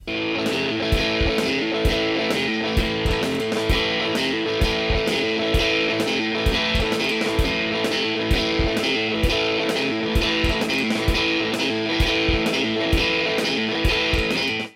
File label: shuffle